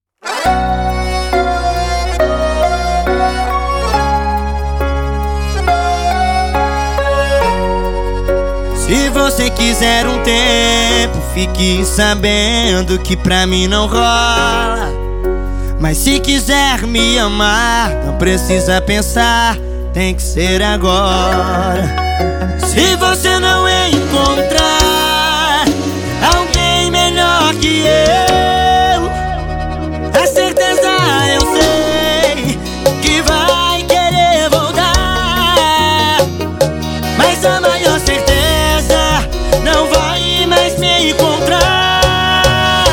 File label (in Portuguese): Sertanejo Piseiro; Fábrica da Música